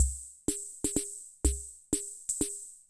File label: Loop, classic machines; arranger